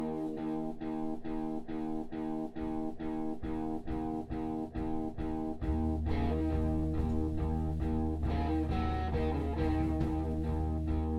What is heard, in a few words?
noise